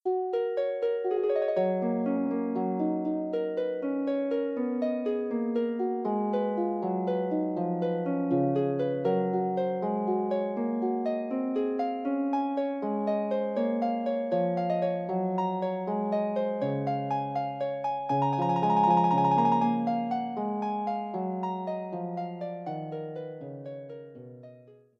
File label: prelude, Harp; Forty Eight Preludes and Fugues Book 1